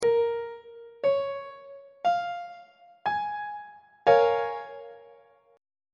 d flat major 6th 3rd inversion on treble clef

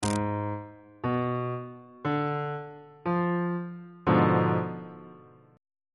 e sharp diminished 7th 1st inversion on bass clef

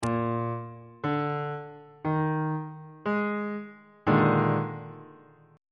e flat major 7th suspended 4th 2nd inversion

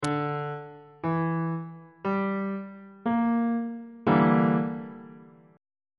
e half diminished 7th 3rd inversion

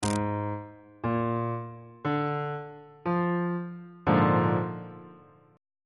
b flat dominant 7th 3rd inversion on bass clef

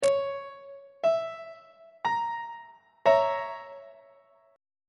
b flat diminished 1st inversion on treble clef

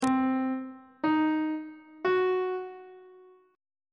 c diminished triad chord intervals on treble clef

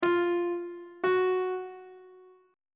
e sharp 1st interval pitches on treble clef

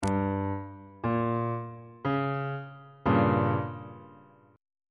g diminished chord on bass clef

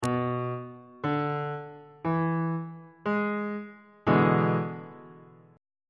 f flat dominant 7th 2nd inversion on bass clef

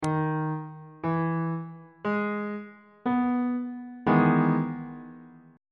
f flat major 7th 3rd inversion

